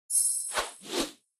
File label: Info Out; candy crush saga gamerip